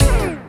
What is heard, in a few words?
Winddown; EMU PX-7